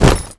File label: foley run